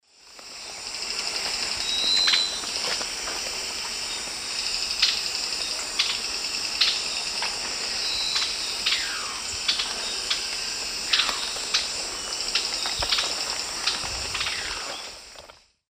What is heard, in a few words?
Gymnopithys leucaspis, scold and call; S. America; Eastern Lowlands